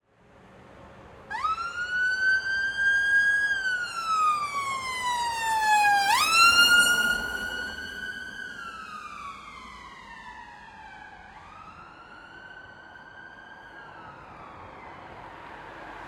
Sirens, Quiet Evening, Light taffic, Closer To Road, UK Traffic; 344 Audio; UK Traffic & Vehicles